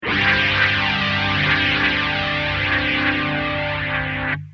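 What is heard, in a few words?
guitar rotosphere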